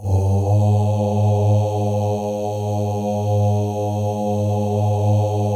male ohs; Spectrasonics; Symphony of Voices

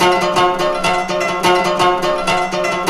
KORA; Zero G - Ethnic

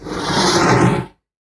Wooden Drag, household rhythm samples hits

